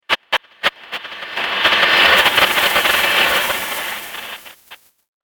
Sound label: Spirit Of The Radiowaves, ghost sound effect; Ghost Sound Effects; horror sound effect; Andrew Fleming